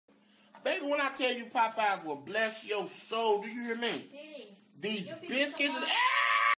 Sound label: aaaaaaaaaahhhhhh; these biscuits